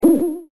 coyote

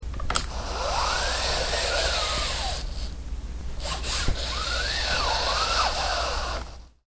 blind up down

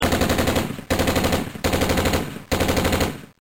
auto

